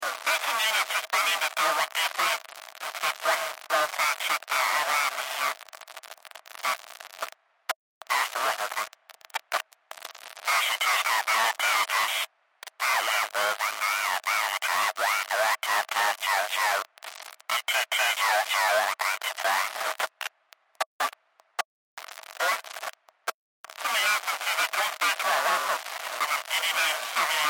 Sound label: Science Fiction Radio Signal Scrambled; Communications